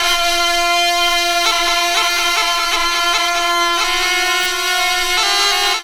Horn Theme; Jungle; Blu Mar Ten - Jungle Jungle - 1989 to 1999 Samplepack

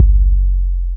Bass - 6A, Jungle; Blu Mar Ten; Jungle - 1989 to 1999

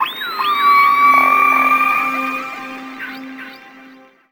Sonic Dolphins; Jungle; Blu Mar Ten - Jungle Jungle - 1989 to 1999 Samplepack

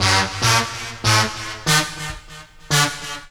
Stabs - 7A, Jungle; Riffs, Arps & Hits; Blu Mar Ten - Jungle Jungle - 1989 to 1999 Samplepack

